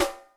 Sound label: Timbrim, Acoustic Snare; Yamaha DTXpress; drum machine